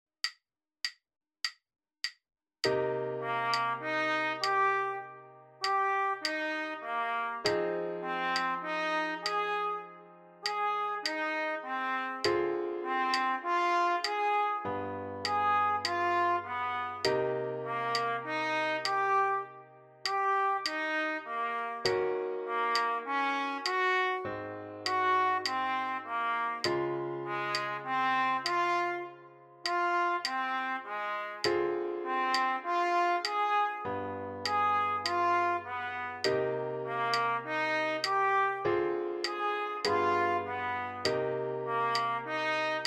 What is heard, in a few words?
3 part voicings on figures